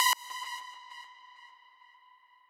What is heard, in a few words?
lead